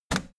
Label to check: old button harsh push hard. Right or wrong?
Right